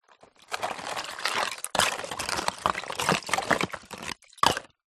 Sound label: nature ice pieces broken in water movement hand